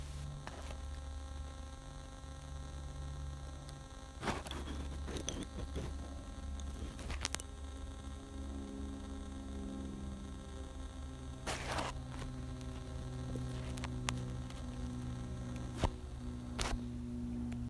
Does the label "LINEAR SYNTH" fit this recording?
No